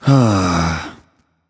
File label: nv sigh; smite; voicepack; mercury